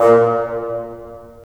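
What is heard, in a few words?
WND BN, wind bassoon; LA Composer vol. 3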